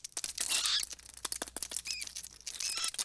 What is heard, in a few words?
rats, ambient creatures; gesource